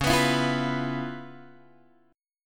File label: Db 7th Sharp 9th Db down Guitar Open G